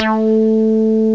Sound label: sweep lo, analog; M8; CMI Factory